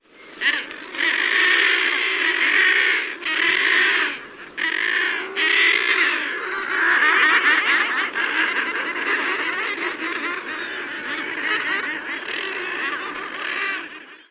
other growling sounds

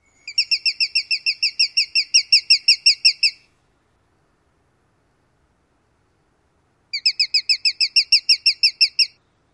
alarm calls near nest

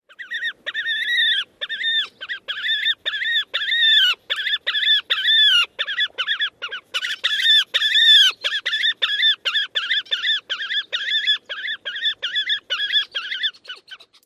alarm calls near nest

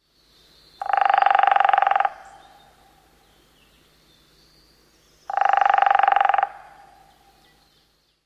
drums; NY

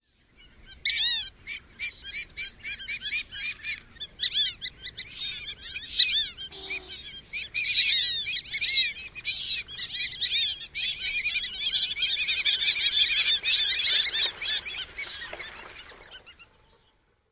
calls of flock; TX

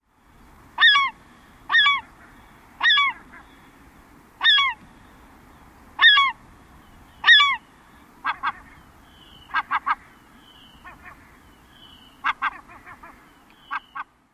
keeyer alarm calls

